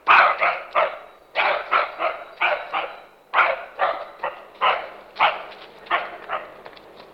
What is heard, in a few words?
barking